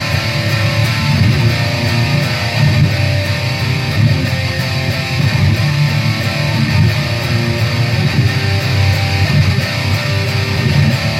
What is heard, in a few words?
METAL